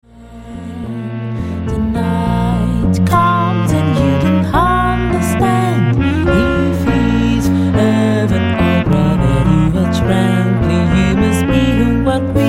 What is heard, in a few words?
Oriental Dream